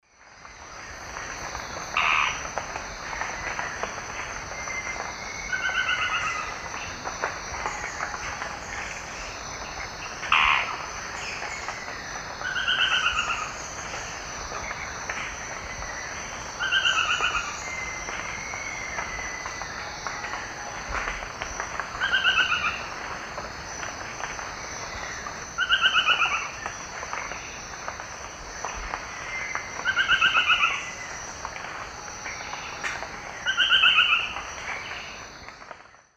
Automolus ochrolaemus, call and song; S. America; Eastern Lowlands

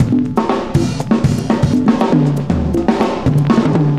cooling 120, loops, 120bpm

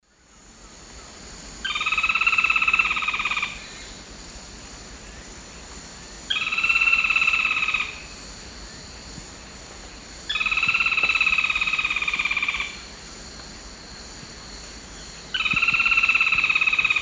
Automolus infuscatus, song; S. America; Eastern Lowlands

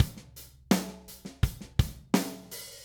Drums, Rock

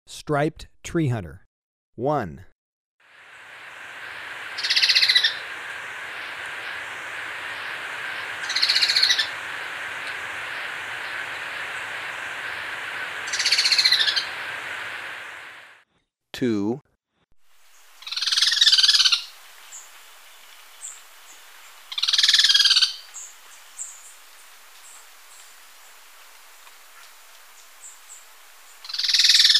Striped Treehunter, Birds of Ecuador; S. America

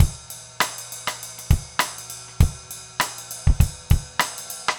basic funk 100 rims

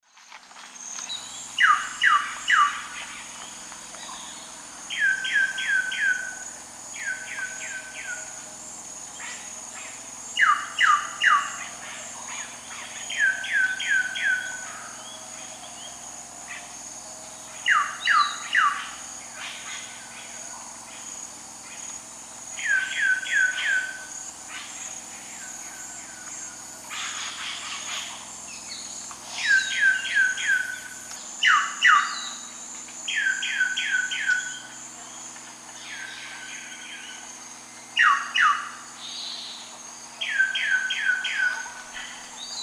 Cacicus solitarius, songs 2 birds; S. America; Eastern Lowlands

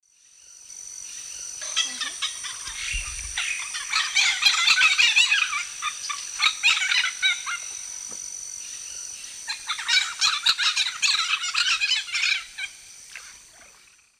Ara severus, flight calls; S. America; Eastern Lowlands

